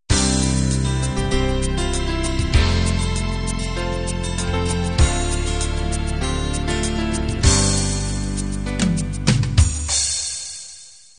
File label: home beat intro